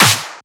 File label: SnareClap; FLPRO